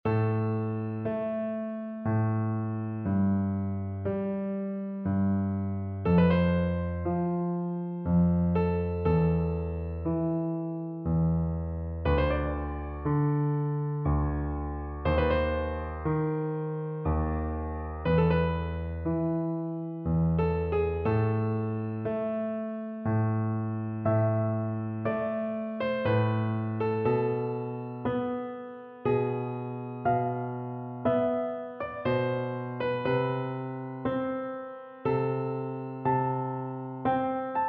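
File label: electric piano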